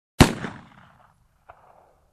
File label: Pistol shot sound